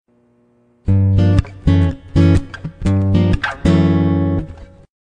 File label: Guitar